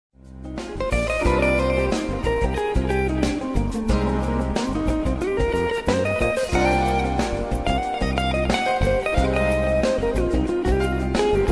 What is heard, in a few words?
jazz smooth